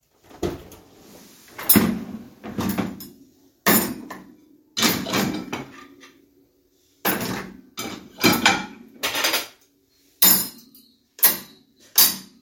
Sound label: Loading dishwasher